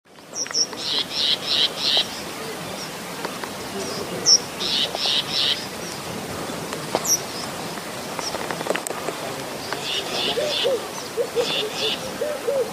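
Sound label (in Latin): parus guineensis, Sikory; Sikora żółtooka; ptaki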